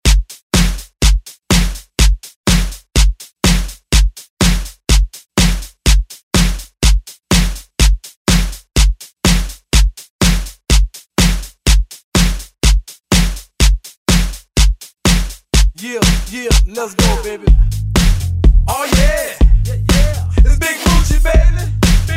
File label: Remix 118bpm